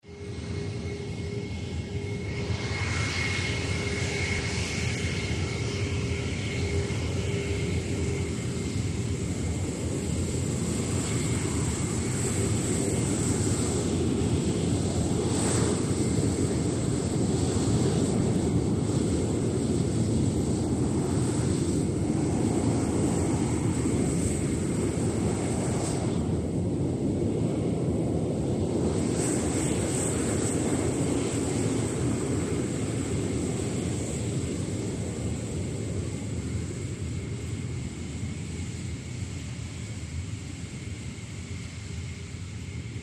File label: Concorde supersonic jetliner taxi, idle, take o